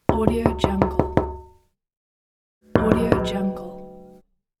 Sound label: knock on the glass